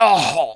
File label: killed, English Voice; The Sum of All Fears